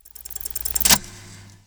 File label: dice roll success